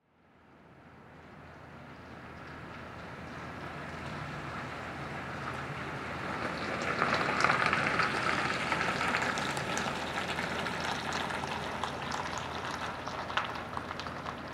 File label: Studded Tires